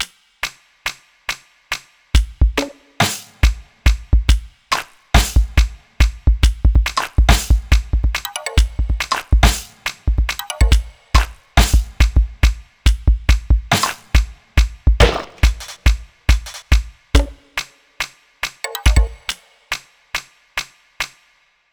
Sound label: Lick Play Along 140BPM